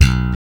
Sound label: 6 STR FUNK; Spectrasonics; Bass Legends